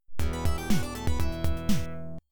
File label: Jackpot Timer End